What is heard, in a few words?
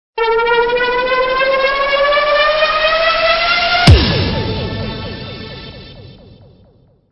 Stinger; STINGERS